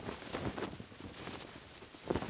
tent flap; Star Wars Obi Wan; XBOX

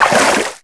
water, footsteps; left; characters

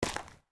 FS Dirt, Footsteps

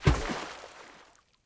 splash small Object; water splashes

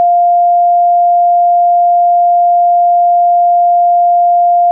Tone; David Beckham Soccer; XBOX

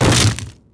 deadfall metal, foley

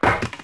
die

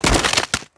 deadfall stone, foley

